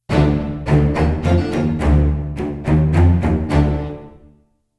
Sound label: Combat Out; the witcher 3 wild hunt